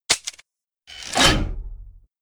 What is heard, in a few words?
Vending machine Drink